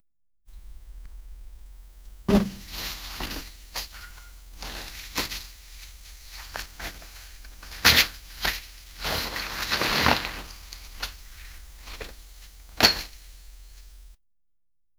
shoe being tied loud; clothing

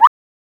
cartoon style pinball hit

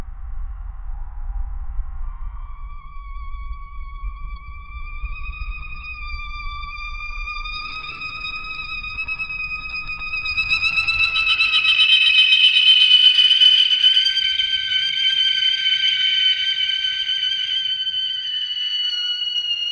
a sudden loud flapping of

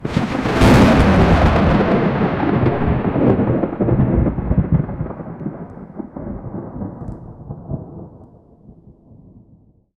vivid comic book style thunder

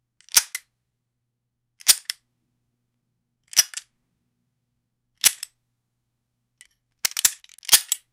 metallic chink of a brass, pistol

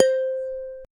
a clean dry audio recordi, single note

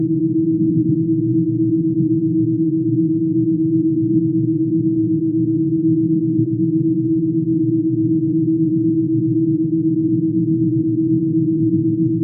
binaural beats, loop; 30 second

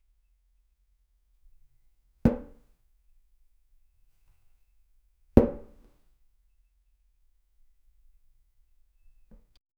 fart pillow

